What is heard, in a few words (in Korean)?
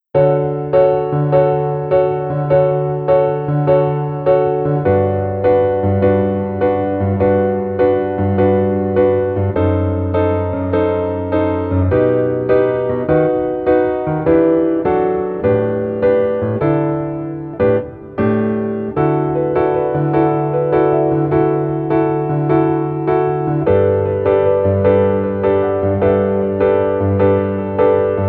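Piano version 멜로디 MR 여자키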